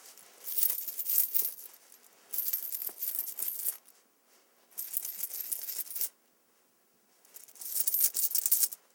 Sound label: Jingling Change In Pocket